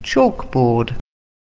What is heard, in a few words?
chalkboard